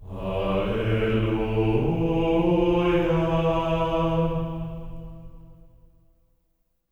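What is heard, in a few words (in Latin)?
alleluia A3; Spectrasonics; Symphony of Voices; GR ALLELU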